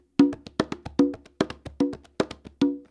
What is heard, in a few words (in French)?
roulement ternaire, congas